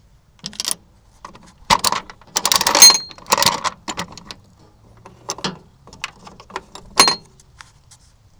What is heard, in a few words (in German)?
kaugummiautomat